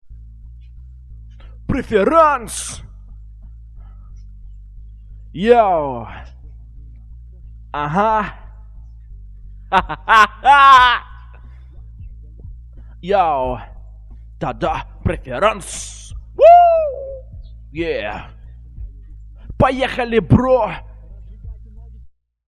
accapella

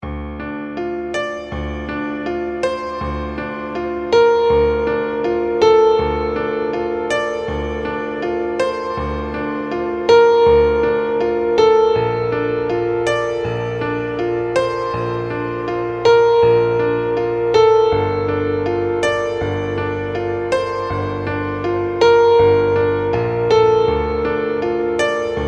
acoustic

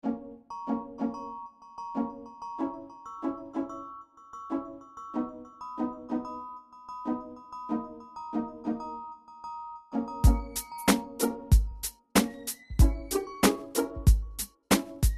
instrumental

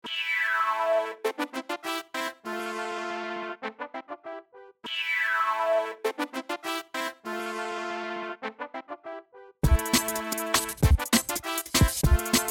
izmena with guitar solo; AI Generated

